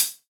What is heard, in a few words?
Hihat Closed